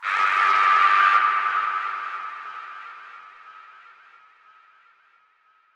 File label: Cry